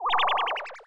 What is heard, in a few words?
Support End